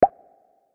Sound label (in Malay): Bunyi Belon Pop